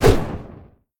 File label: recall punch fire; Smite; Susano